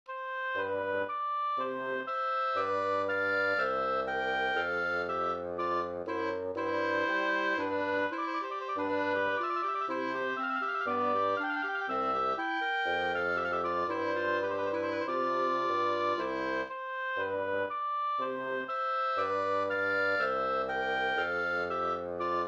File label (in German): trio c dur; kellner johann peter